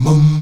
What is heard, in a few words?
VOX MUM D#3D, Afro Chants, Stacc Mums; Roland LCDP11 Africa VOL-1